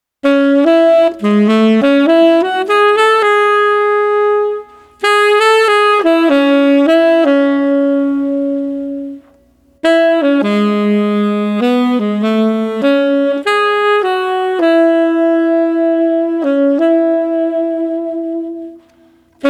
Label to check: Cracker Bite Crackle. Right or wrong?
Wrong